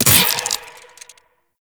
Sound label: Mech, Weapons, Sentient; Warframe; Shedu